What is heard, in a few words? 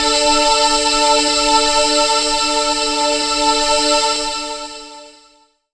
String Loop; Zero-G Jungle Warfare 1, 2 & 3; Strings & Things